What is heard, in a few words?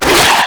Infested Runner Arm Attack C; Enemies; Abilities; Warframe; Proto Infested